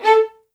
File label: MED ATT A4, soft violins; Q Up Arts; Denny Jaeger Master Violin Library